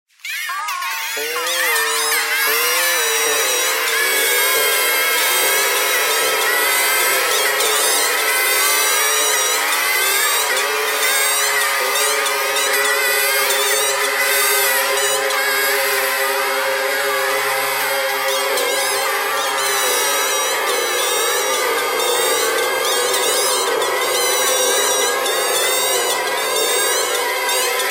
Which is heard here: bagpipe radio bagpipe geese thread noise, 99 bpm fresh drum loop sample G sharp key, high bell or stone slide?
bagpipe radio bagpipe geese thread noise